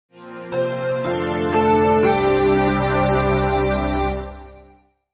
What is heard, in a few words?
Music Logo Ethnic, flute